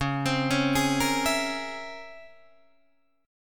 Db Minor Major 13th Db Guitar DADGAD; arpeggiated

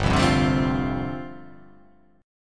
G 9th Suspended 4th

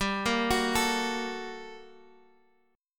G Minor Major 9th G down Guitar Drop D

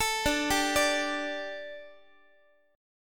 D Suspended 4th D, Guitar; Dsus4; arpeggiated; x,x,12,12,11,13

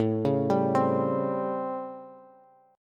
A 7th Sharp 9th A, Bass; arpeggiated; JGuitar

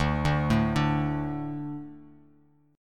D 5th D, down, Bass Standard; D5 arpeggiated; JGuitar

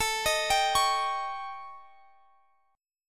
A 7th Flat 5th Guitar Standard; x,x,19,20,20,21; A7b5 arpeggiated; capo=3

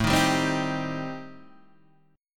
A-Minor 7th Flat 5th, Guitar DADGAD, chord

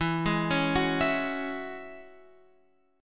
E 6th Add 9th, Guitar, Open F; E6add9; arpeggiated; x,11,8,8,9,11

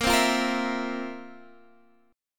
F Major 7th Suspended 4th Bb

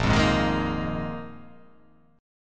A sharp 7th Suspended 4th A sharp

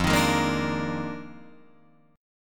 F Minor 13th, Guitar Drop D

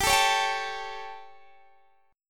G Suspended 2nd Flat 5th G, Guitar, Open D; chordshape; down

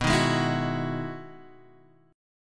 E Major 9th B, chord

